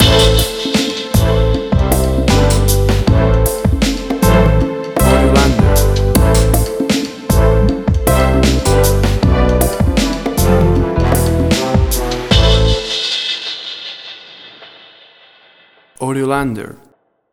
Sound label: Upbeat Orchestral Hip Hop